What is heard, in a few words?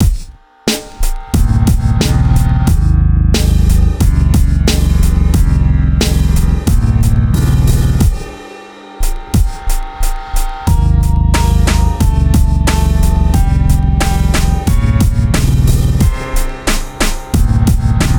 piano